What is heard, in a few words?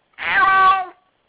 cat meow